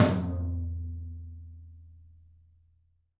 TOM, Studio Toms; 3D Percussives

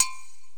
Korg x5, Drums

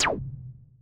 zap, Roland MC-909

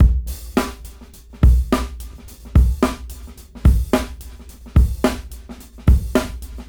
Brown 105 BPM; AMG - Keith LeBlanc Phat Butt Jams

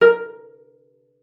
A#4, CELLO PIZZ; Xsample VOL-5

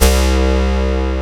SPLIT; Rubber Chicken Software Sample Bank

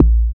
BD ANALOG; Sound & Vision - Gigapack II CD 2

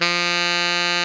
TENOR, Saxophones; Greytsounds - Philip Wolfe David Crozier's Saxophones; TENOR SWITCH

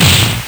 LSDj, Nintendo Gameboy; 300 Drum Machines

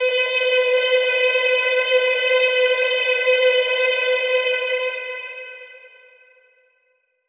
Echo Proc B, alarm siren samples, 120bpm; musicradar

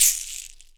SHAKER AFR, Drums; Big Fish Audio; Ross Garfield Drums VOL-2

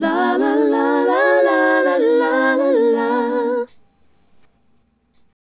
Female Group, Hip-Hop and RnB Vocals; Sonic Foundry (Sony Creative Software)

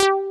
SYN BASS; Pro-Rec - Synthsonic; BASSES

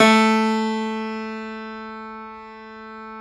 Pop Piano ff -ped, A-1; Classical Prepared Pianos; Total Piano

